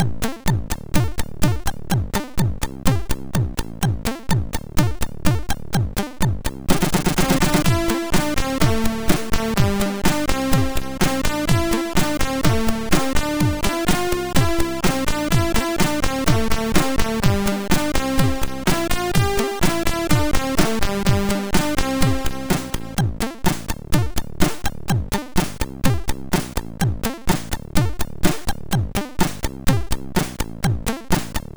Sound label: sid MOS6581R2; No Name Remix